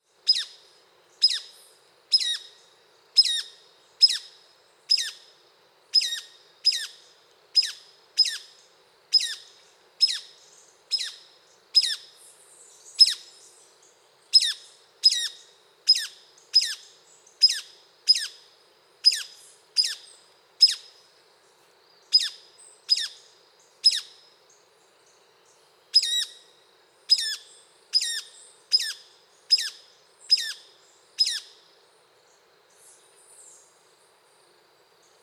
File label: Call, Birds of the Costa Rican Cloud Forest